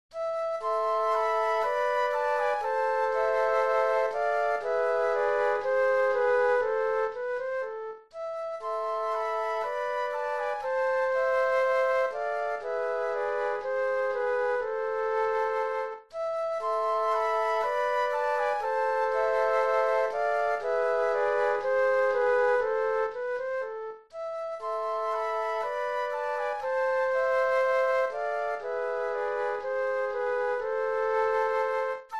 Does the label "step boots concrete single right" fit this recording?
No